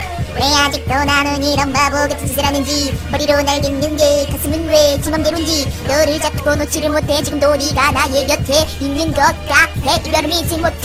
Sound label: Funny Rap; Chansung